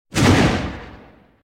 Spotlight Power On; Hollywood Edge Sound Designers Tool Kit

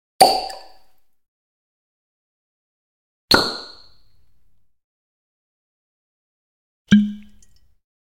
Wine Cork; 100 sound effects

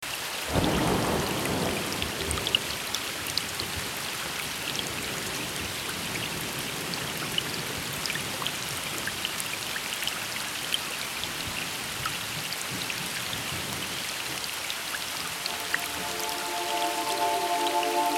Rain, Rolling Thunder; Abstracts In Rain

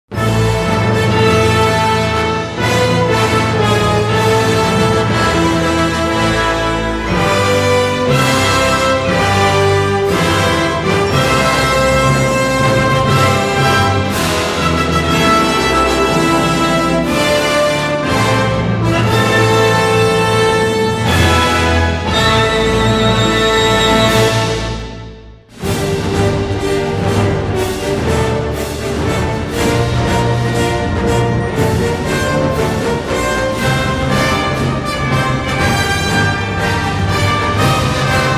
Bulgarian Anthem; Harry Potter Quidditch World Cup

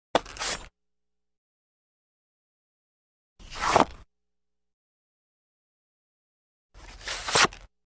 Sword Draws Medium Light Grab Of Scabbard & Sword; Hollywood Edge Foley Sound Library

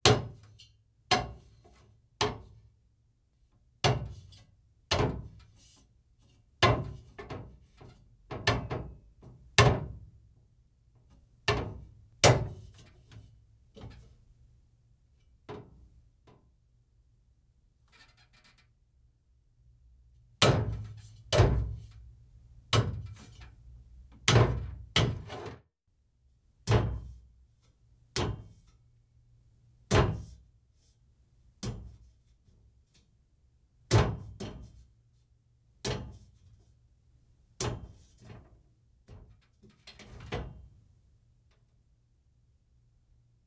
Climbing Scaffold Grabbing Metal Pole; Foley; Foley Essentials; Foley - Misc

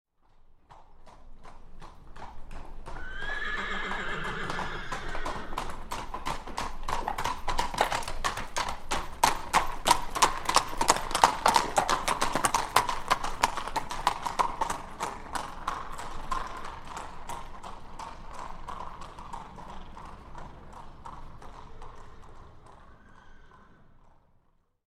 Two Horses Trot By On Cobbles; Historical; Hollywood Edge Historical Series; War Horses Armies & Weapons

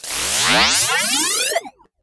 whoosh; Whooshes and sweeps

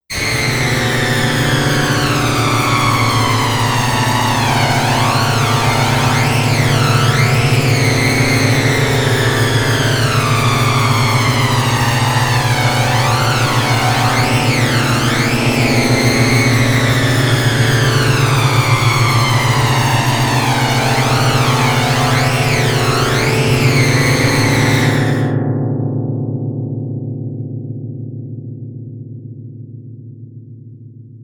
Halloween The Torture Room; Best Of N2O Library; Drones & Foundations

